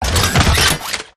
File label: gunstand deploy; gow; turret judgement